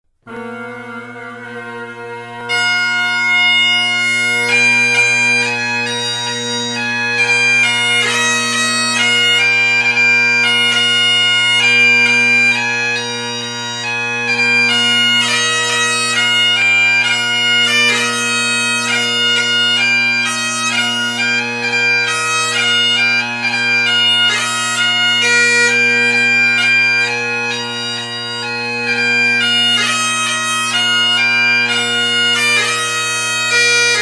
Classical bagpipes

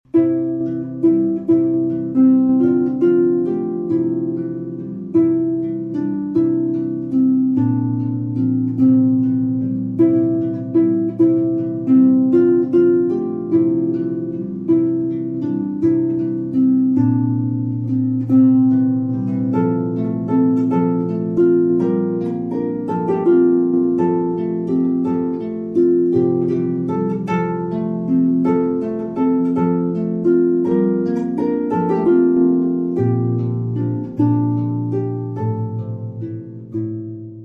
Classical

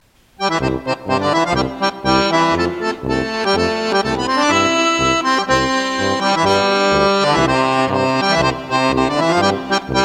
Dixie, Dance Band

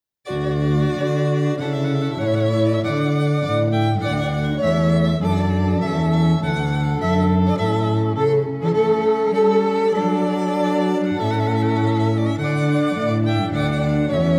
Trumpet Voluntary, String Quartet; Classical Quartet; Clarke

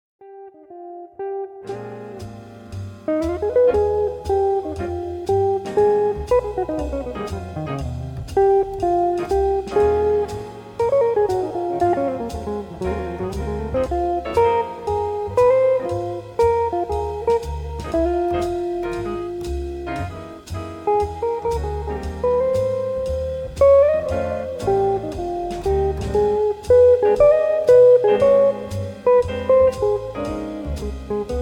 Swing, Guitar

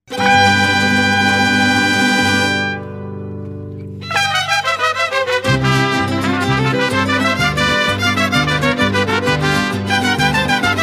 Jarabe Tapatio; Classical

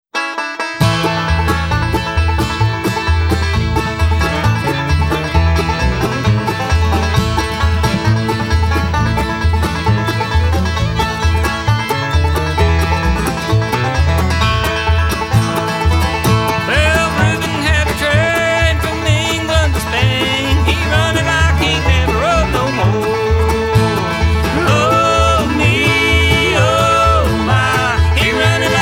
Reubens Train, bluegrass band

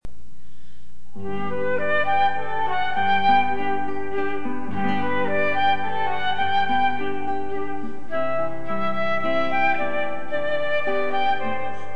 menuet, flute; Menuet from The Notebook for Anna Magdalena Bach

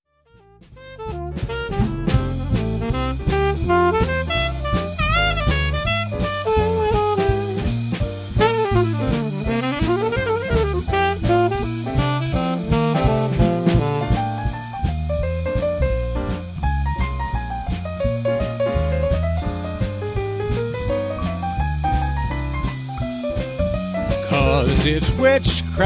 Combo, Swing Band

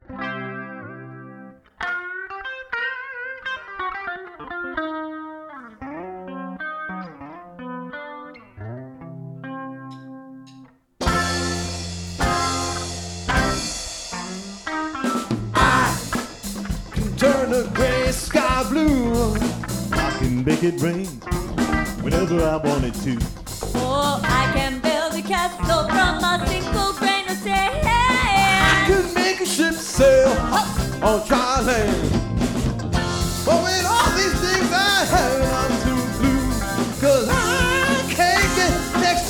Dance, Steel Drum Band; Atlantic City NJ

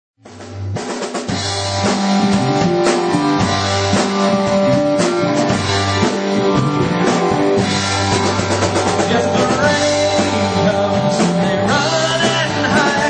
tribute band

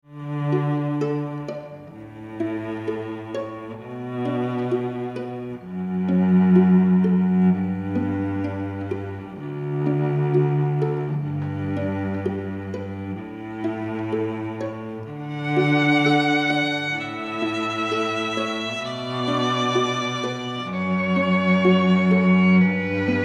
Quartet, classical string quartet

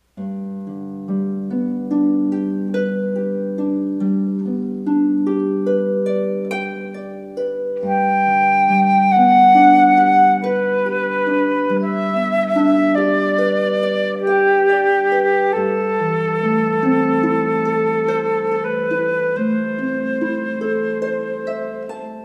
with flutist, Harp; The Swan; Kirsten Carrell

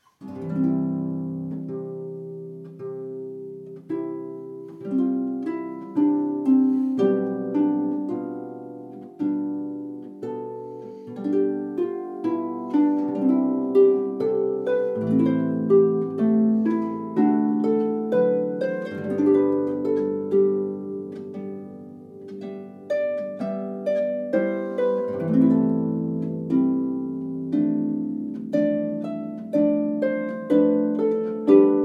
Andante from Violin Sonata No. 2, Harp; J.S. Bach

